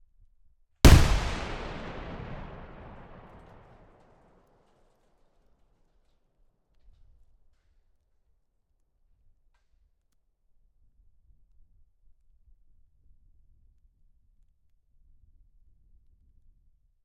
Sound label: Tank Mine - EXPLOSION - Mounted on Metal Beam - DISTANT; AMBEO BLD; Pole Position; The Warfare 2 Library